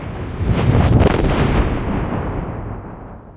portal beam shoot; Garry's Mod; hl2; citadel

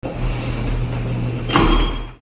enter garage; AssaultCube; Alien Stars; American Muscle Series

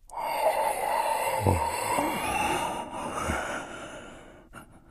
breathing, infected; npc; idle

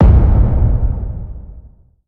exp big; AssaultCube; Alien Stars

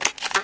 shotgun reload; weapons; Garry's Mod; hl2

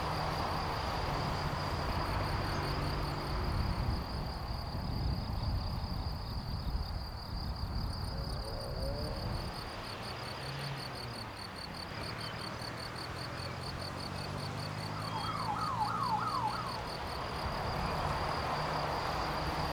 distant cars, ambience; AssaultCube

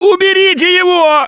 scream while pounced; Gambler; russian

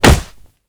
blunt hit high; Mount&Blade Warband

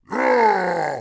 Fort Vox; Sid Meier's Civilization 4 Complete; Warlords; Units; Omen

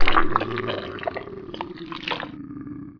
flesh born; Emperor - Battle for Dune

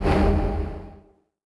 submarine run loop; Sid Meier's Civilization 4 Complete; Beyond the Sword; Final Frontier; Units

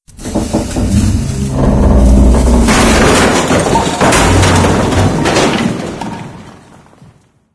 timed debris fall

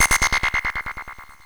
notify

